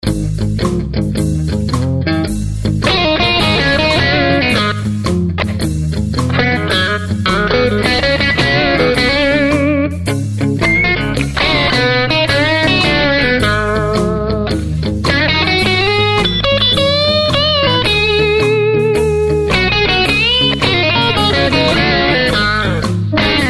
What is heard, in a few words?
Blues